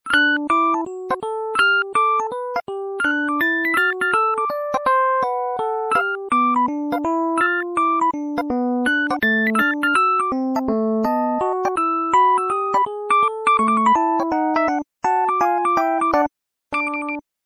Jazz Tone, phone tones; LG JN240 Remarq

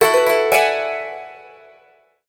Dulcimer (short); Palm Pre

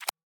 basic lock; LG K10 T-Mobile

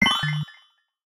Toy Bell, notifications Fun; Samsung Galaxy S23

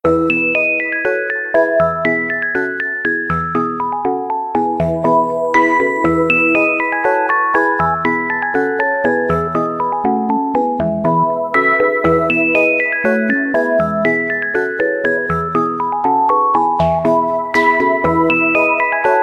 Lullaby, ringtones; LG Revolution VS910

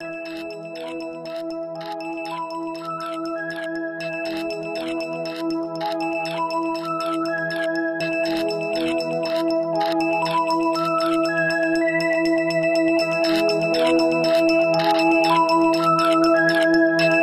Peacefully scratching

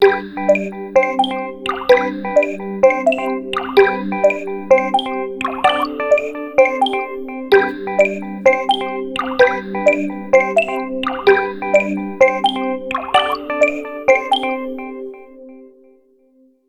Rainy Street, ringtones; LG V31 isai VL

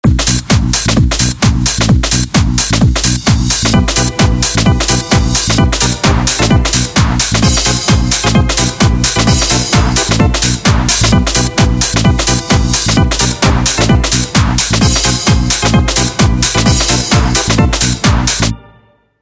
jingle rave, ringtones

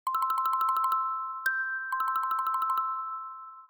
alarms abstract; Amazon Fire-Phone

